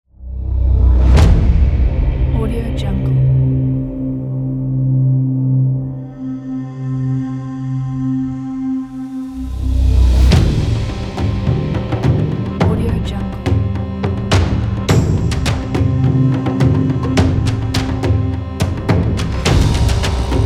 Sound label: viking